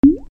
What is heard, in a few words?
water drop